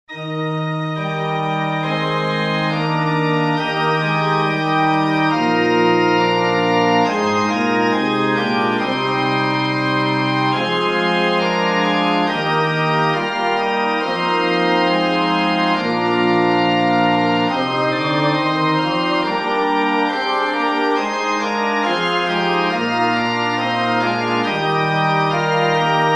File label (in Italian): organo